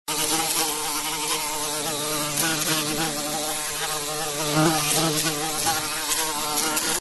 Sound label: bee sound